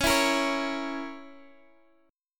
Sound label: Bb Diminished Db